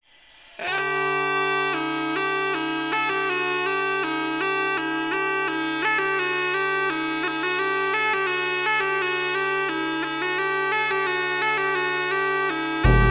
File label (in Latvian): Azha raga melodija